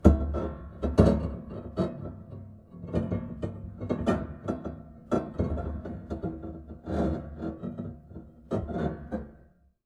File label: String Rub Erratic Long, piano; Piano Miscellaneous